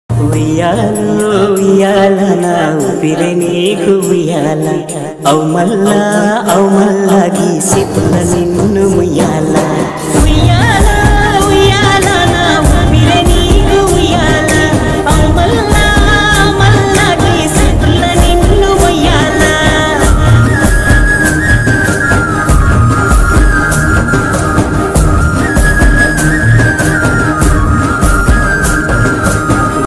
lofi telugu ringtone

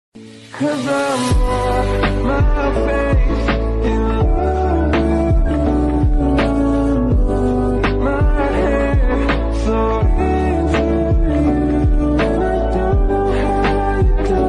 slowed, english ringtone

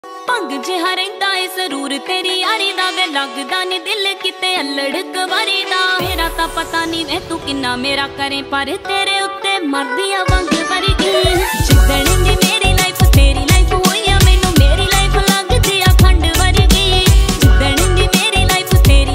ringtone

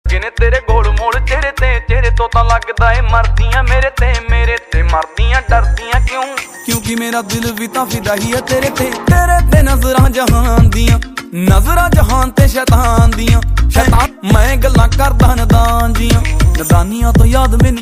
punjabi ringtone

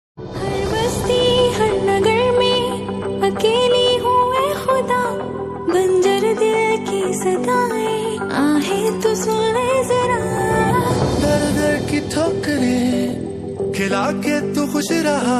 Ost pakistani ringtone

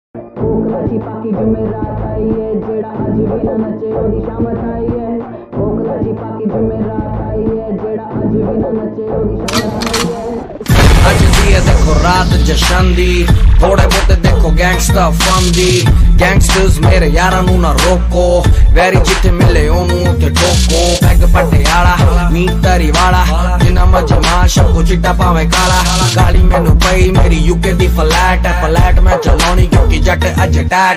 slowed punjabi ringtone

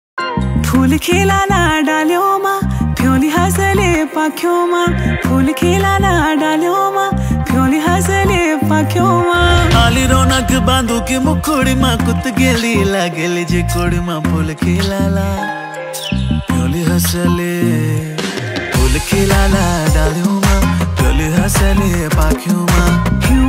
uttrakhandi ringtone

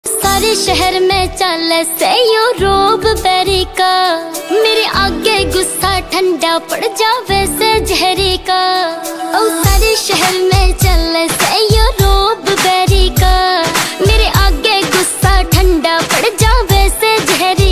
haryanvi ringtone

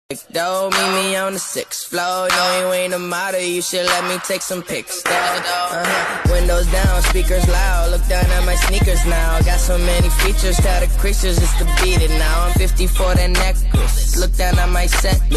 rap english ringtone

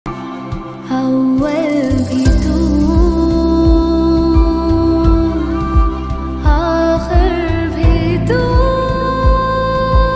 arabic ringtone

